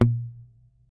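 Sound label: Yamaha mute A#2, Bass; Masterbits CLIMAX 7; PATITUCCI BS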